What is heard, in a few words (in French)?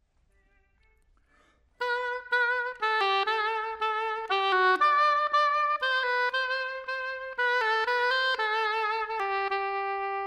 Hautbois